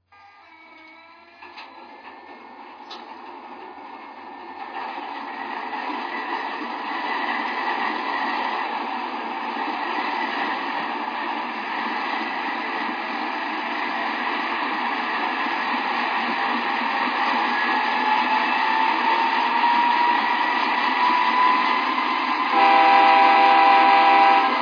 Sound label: es44ac; es44ac cp